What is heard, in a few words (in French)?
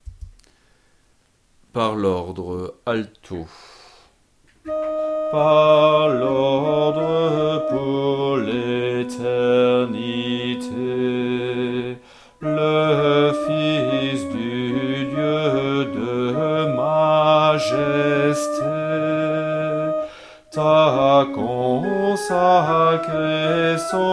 Ordre alto